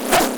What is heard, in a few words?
flechette, ministrider; npc; Half Life 2; Episode 2